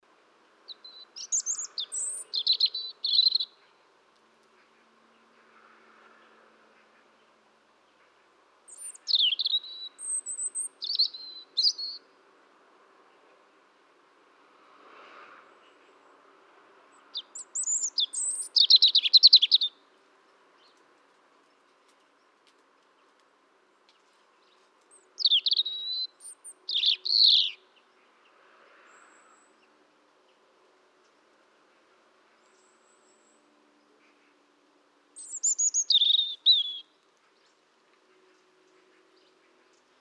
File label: Erithacus rubecula; SETE